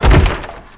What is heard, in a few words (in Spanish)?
cerrar madera, puertas; ruidos